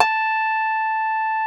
A4, SYNTH PLUCK; Music Store - Claudius Diemeter Schwerlos

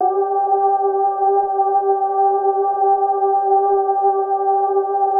GLAMA G3, SYNTHVOICES; Music Store - Claudius Diemeter Schwerlos

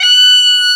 Mizmar F#5; Multisamples; Digital Sound Factory Planet Earth

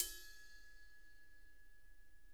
ride; Sonic Reality; Interactive Drum Kits; 90s sample cds

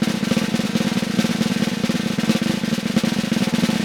Snare Drum, 5.5X14; Vintage Snare Drum; Q Up Arts; Johnny.C's Vintage Snare Drum

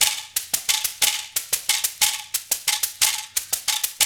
120 Tribal Vocal

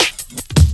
Swing Cut, Drum Loops; Jungle; Swing 160.5; Zero-G Jungle Warfare

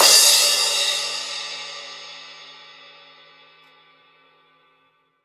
raw crash; acoustic drums; USB Soundscan vol.10; AKAI; 90s sample CD

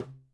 Tom, Drums; PX7; Digital Sound Factory Protean Drums

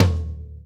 FTOM, Drumscores, KITS SMALL; 90s sample CD

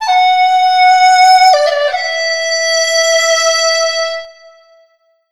CH FLUTE, CHINES FLT, Asian Traditions; USB Soundscan vol.60; AKAI

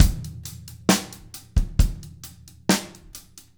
133, drum

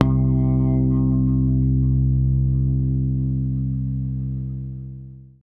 OCTIVER C2, bass; Sampleheads; Ultra Freakin' Bass

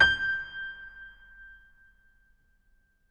MF71 R, Acoustic Piano; InVision Interactive; Miroslav Vitous

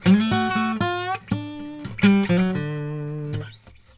Blues Guitar, LICK; ILIO; Fretworks

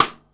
rim, Drums Acoustic; USB Soundscan vol.10; AKAI; DYNAKIT 1

